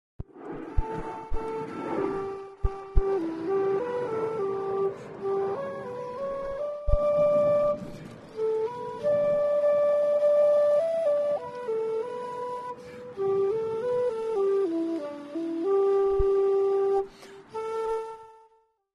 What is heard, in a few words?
flute